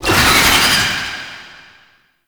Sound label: Summon Vocal Verb; Warframe; Uriel; Gulphagor